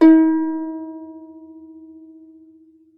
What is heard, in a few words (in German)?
HAKENHARFE; SoundBytes; Early Patches